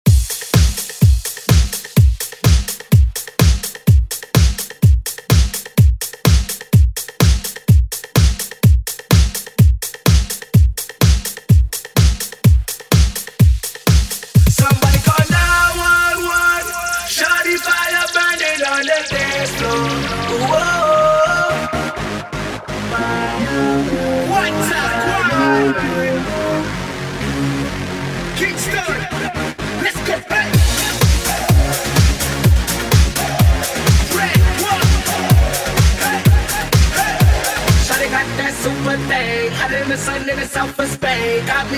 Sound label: Pop Redrum; Deejay Hit